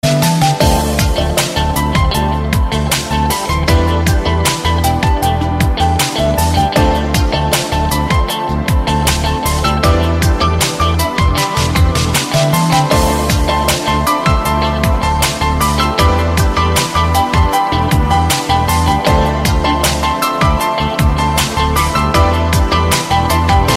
beautiful instrumental music english ringtone

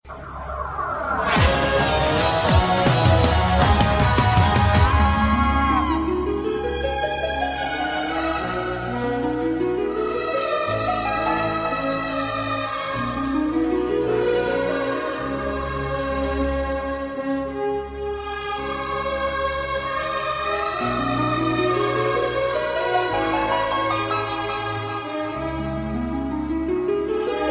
sad with disco start ringtone

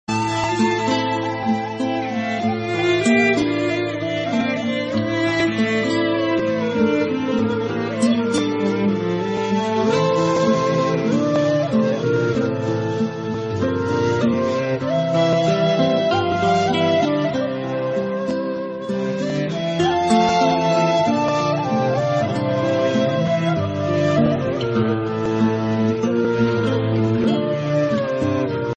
romantic and sad music ringtone

